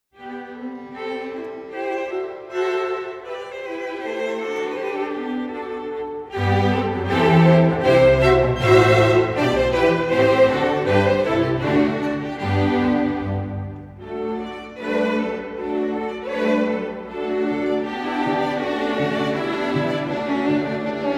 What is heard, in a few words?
Gavotte Allegretto Musette Poco più mosso; Holberg Suite, Op.40 - III.; Neville Marriner; Grieg